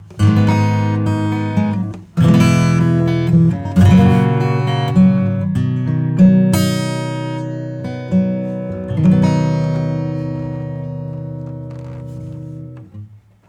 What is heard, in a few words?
acoustic